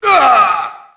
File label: merc get hit; Max Payne